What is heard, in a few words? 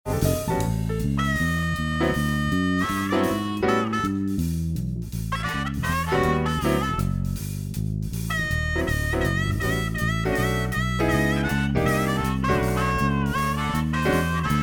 Harmonica Solo